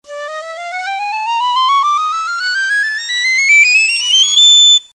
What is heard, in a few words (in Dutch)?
piccolo range; blaasintrumenten